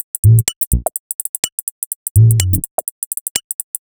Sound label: 125bpm, House Drumloops